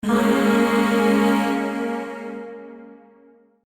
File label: Shawzin Yo Chord; Warframe; Zariman; Void Shawzin; Chords